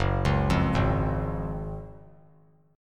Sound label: Gb Major 7th Suspended 2nd; GbM7sus2 arpeggiated; JGuitar